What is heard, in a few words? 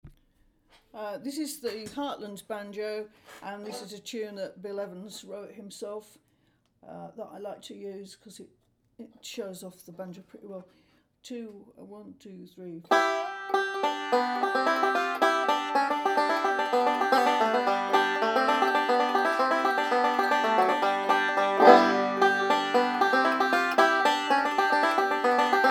bluegrass, banjo